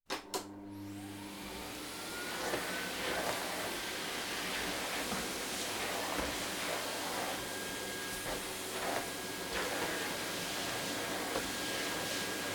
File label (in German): Staubsauger